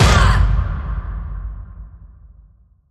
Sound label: Vocal Fury Fighter Slam